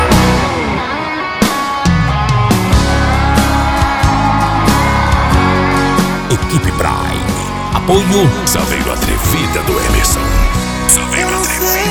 moda de viola; frequency mix

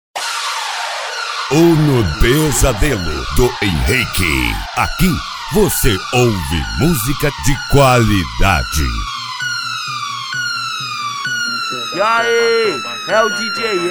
rave da sirene eletrofunk; uno pesadelo do henrique

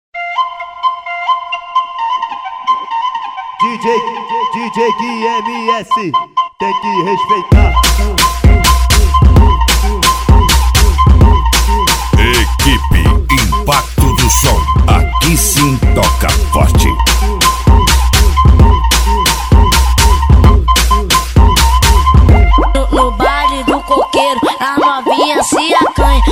flauta magica; cd equipe impacto do som 2025

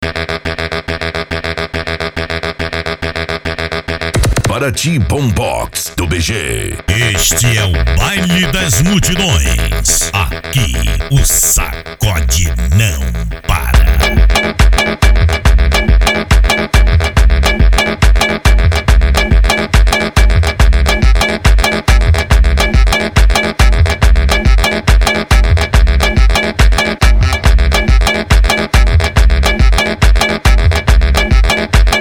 bombox funk

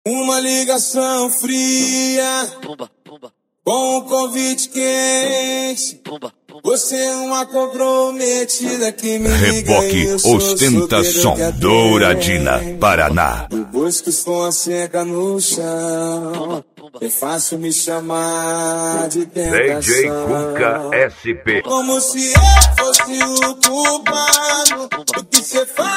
som car; reboque; douradina parana; ostenta som; equipe evolusom

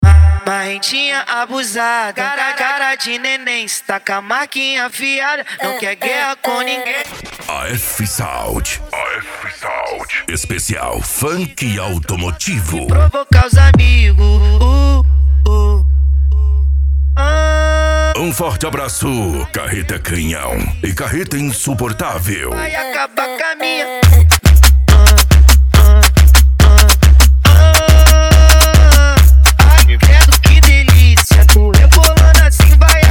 esp funk automotivo; afsound

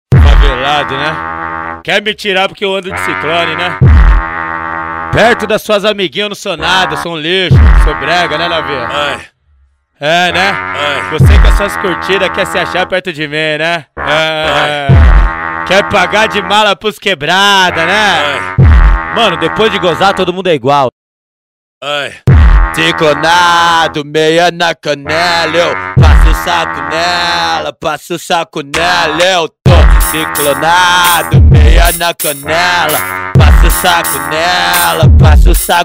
funk automotivo; funk automotivo 2017 uberlandia mg

